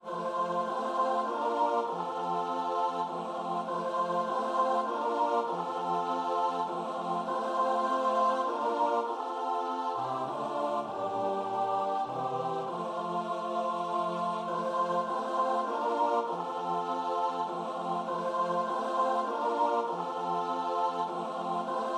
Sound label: in thee gladness CHOIR